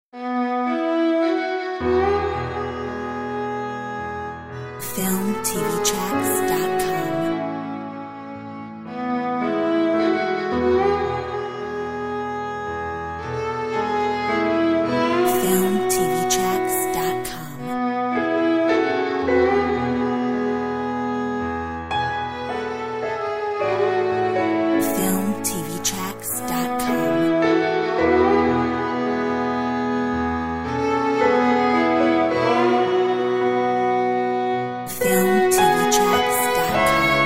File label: Americana